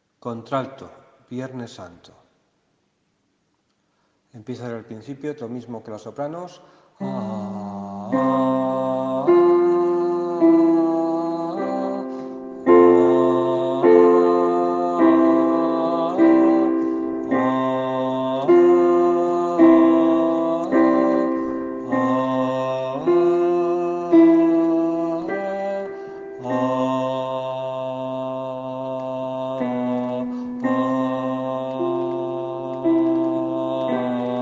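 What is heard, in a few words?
alto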